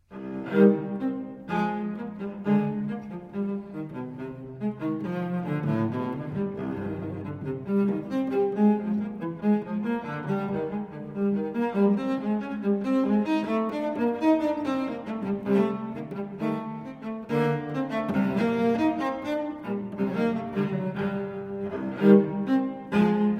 Unaccompanied Cello Suite No. 5 in C Minor Gavottes I & II; BWV 1011